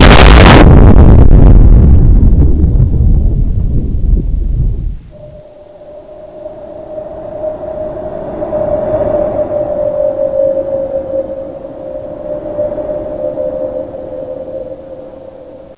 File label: super nuke det; quake2; kitsune